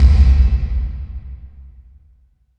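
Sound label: BD HALL, reverb; SPX900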